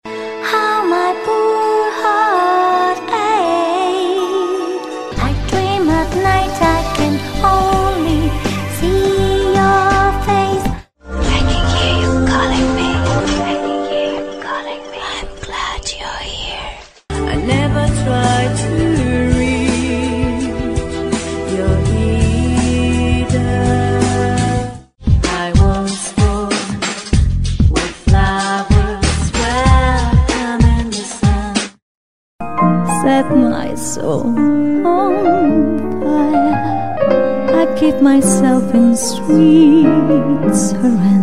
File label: mix songs slow